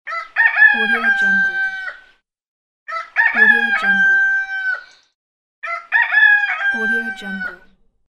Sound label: rooster